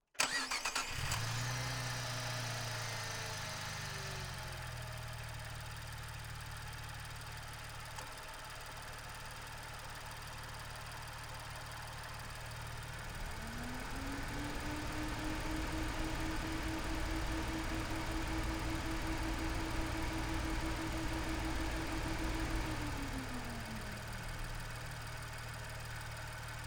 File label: Alfa Romeo 159 SW onboard startup idle blip steadies shutdown Engine; Pole Position; Alfa Romeo 4C 2016